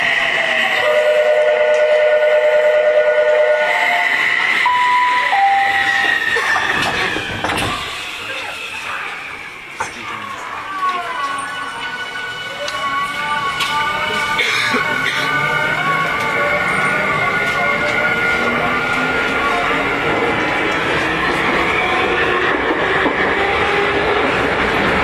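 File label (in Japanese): 走行音, 5050系; Tokyu; 田園調布-多摩川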